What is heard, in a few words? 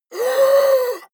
monster inhale; kreaturen